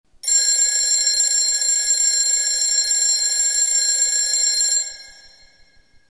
alarm vintage

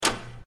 door open, undertale

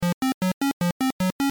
alarm

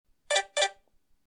dimples; blackberry bold 9700

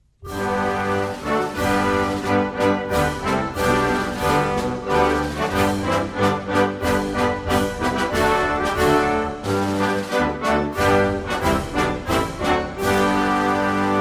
anthem

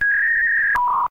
dialup; undertale

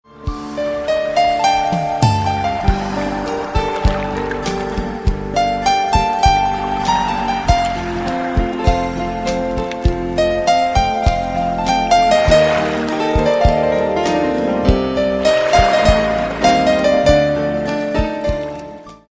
classic ringtone sea

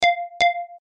low power, iphone

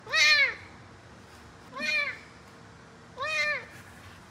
serval

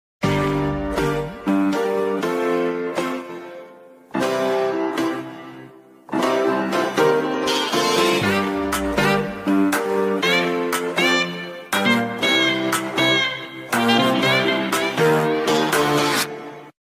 edge 20pro, motorola

